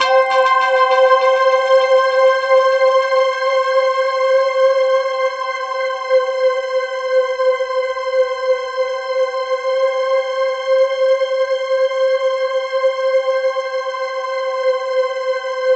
C4, SynthLine; 90 s Sample CDs; NiceBeats; SynthLine VOL-11

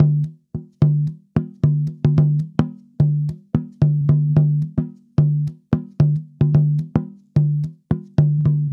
Tambora, African

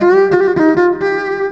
EVIOLIN, ELECT VIOLIN; Best Service; World Colours

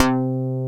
bass FM; Best Service Gigapack 2

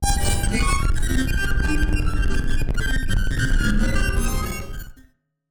Battered Synth; malfunctioning synth samples

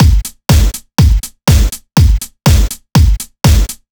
Drums, 122bpm, Nu Dance Electro; Zero-G; Soundclash; Acidized Wavs; Combi Loops

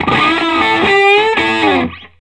Vintage Blues Guitar, SOLO; USB Soundscan vol.22; AKAI; SOLO A125

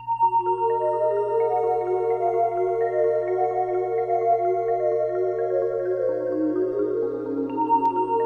AMBIENT, SYNTH SEQ; AMG; Global Trance Mission VOL-2

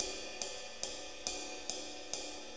6-8 Ride, HiHat & Ride Loops

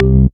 Basses Root C

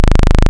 MP SAW; INIS; Vintage

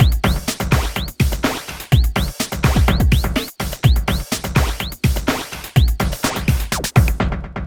Processed Beat, Drums & Percussion

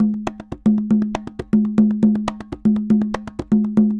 Congas Samba 120 bpm; Best Service; Festa Latina VOL-1

